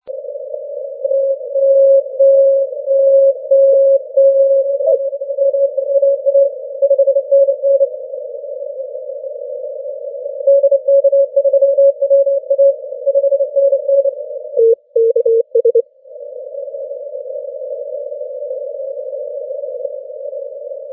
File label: 80m cw